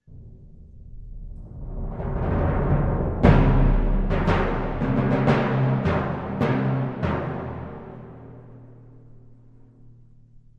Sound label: timbales; chasseurs